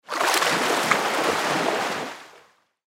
Alligator Movement, Alligator Movement Water Splash Fast; Animal; Alligator Sound Effects